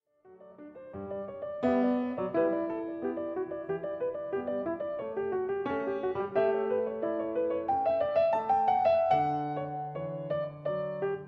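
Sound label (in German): Variation 20 für 2 Clavier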